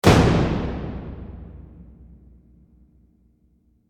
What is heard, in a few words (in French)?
att res tambour